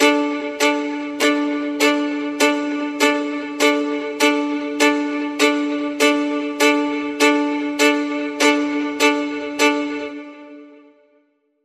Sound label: String Pulse